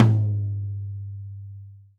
Tom Med; hydrogen drum samples

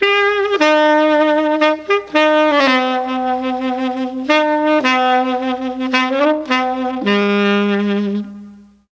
C4 foxtrot sax, mellotron

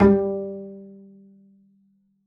pizz g3, double bass; dsmolken

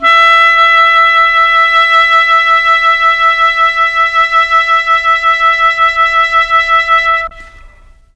E4 clarinet, mellotron

